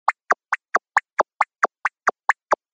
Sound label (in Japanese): コミカルに走る; 動き; 音人